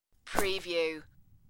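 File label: Flowers Rug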